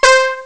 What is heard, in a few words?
ahh vox; Amiga Soundtracker Sample Packs